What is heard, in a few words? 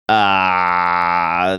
drawn out ahhh